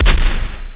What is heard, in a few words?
carbine